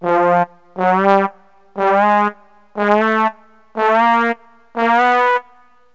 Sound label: long horn, music; Wired for Sound Pro CD; Aristosoft, Inc.